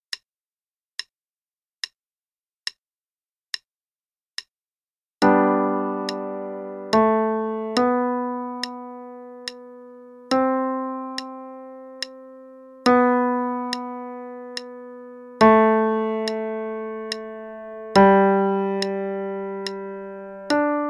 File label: Minuet in G Major (LH only, qn=72); Bach